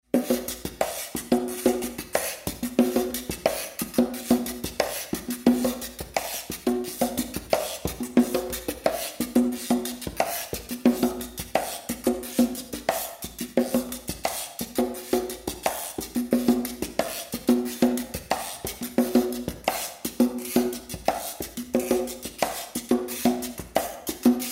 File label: Percussion LXP Room